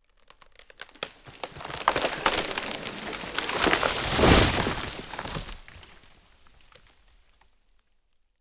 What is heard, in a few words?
Tree Cut Crack And Fall In Forest Stereo